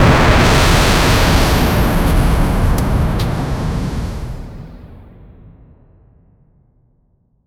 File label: explosion; blast